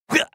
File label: punch